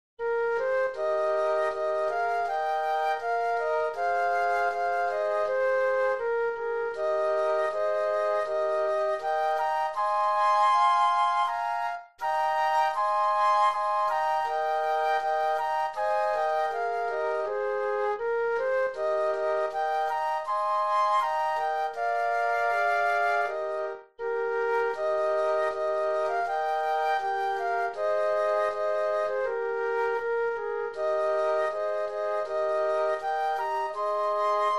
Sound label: Flute Trio; Loch Lomond